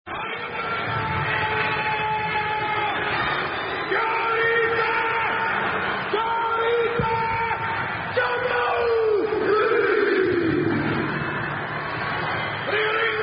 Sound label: Rugby Chants At End Of Video; Avant !